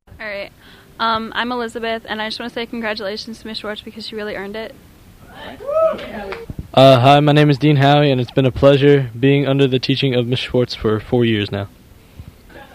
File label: High Band; Ocean City